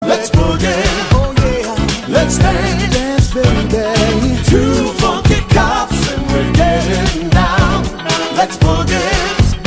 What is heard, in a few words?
funky; funky cops lets boogie